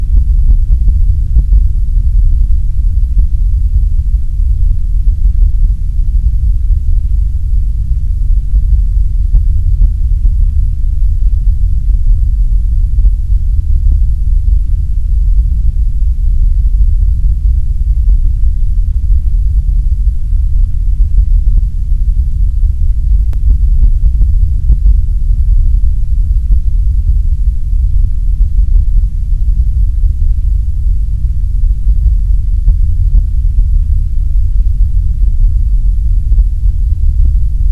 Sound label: Brown Noise